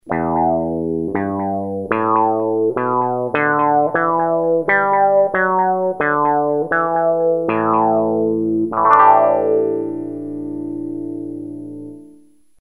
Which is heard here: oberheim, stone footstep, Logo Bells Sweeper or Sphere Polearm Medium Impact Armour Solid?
oberheim